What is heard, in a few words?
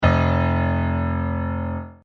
F1 audio music note